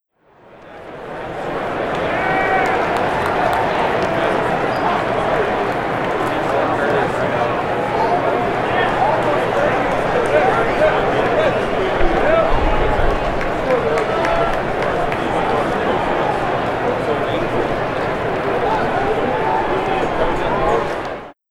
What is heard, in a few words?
Gateway Arch Baseball